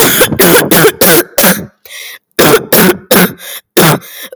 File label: sick kid coughing